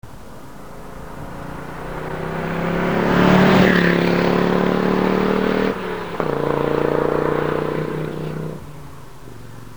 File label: Audi Urquattro 10v Flyby